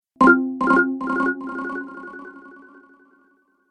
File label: App Delay, ping; multimedia